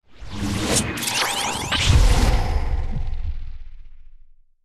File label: sword soul card reload; pixel gun 3d